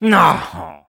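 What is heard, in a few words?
negative vocalization, French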